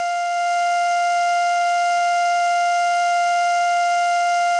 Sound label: CALLIOPE F3; Sonic Reality; American Heartland